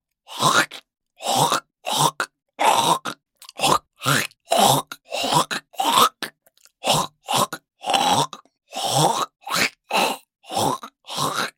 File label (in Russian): zvuki pershenie v gorle kkhm